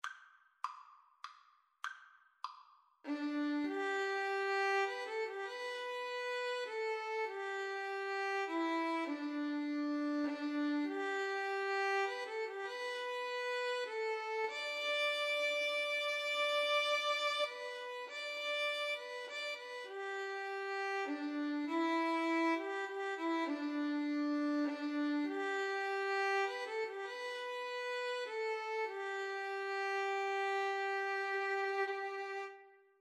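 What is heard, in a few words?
amazing grace violin guitar duet karaoke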